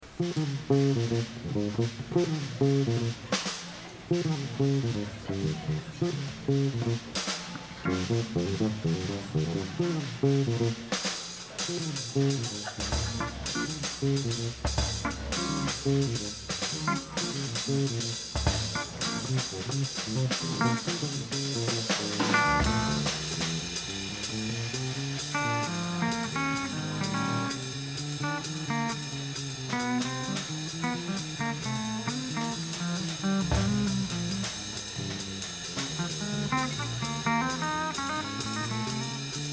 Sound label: Blues Improv